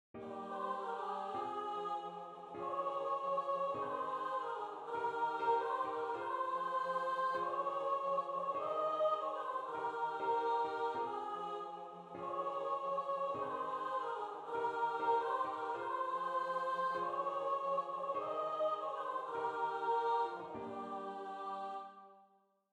solo bars 92 to 102 piano

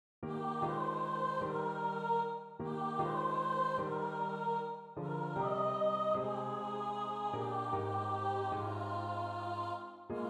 as torrents in summer sops

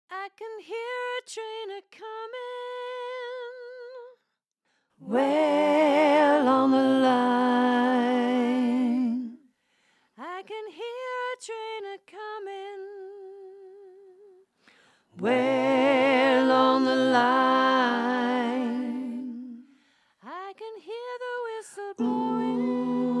tenor learning track

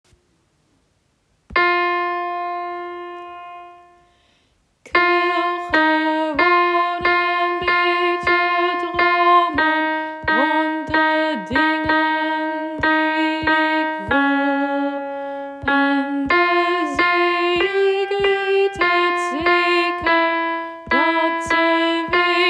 dutch song alto part second verse

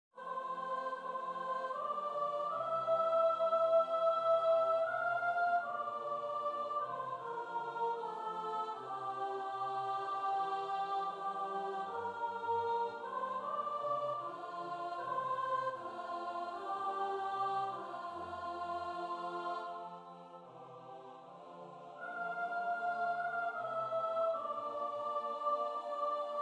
the silver swan sops, choir

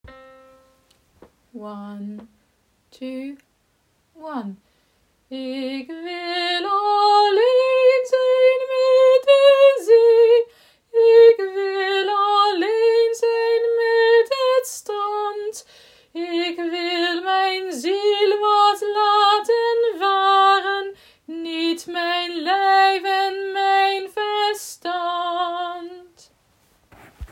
dutch song sop

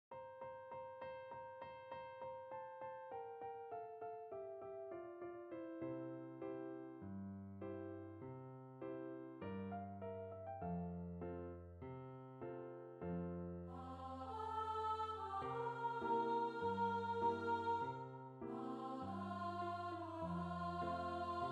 i have a d satb piano

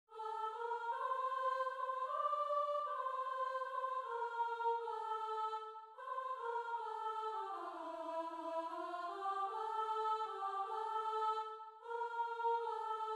con amores sops, choir

